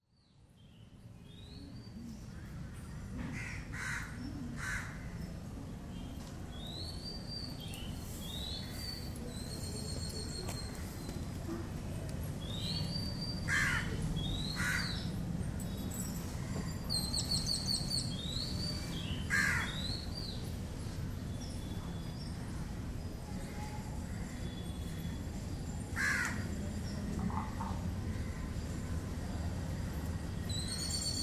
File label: soundscape ashram samadhi